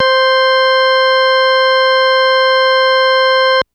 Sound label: Organ B3 c5; TrashLibrary